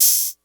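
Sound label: open hat, drum machines, Roland CR-8000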